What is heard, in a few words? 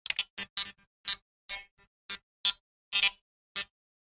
Droid Random Beeps, robot sound effects; science fiction sound effect; Andrew Fleming